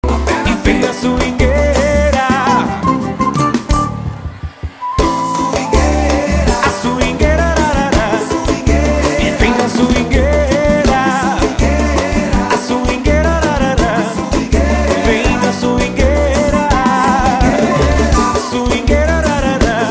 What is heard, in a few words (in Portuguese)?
suingueira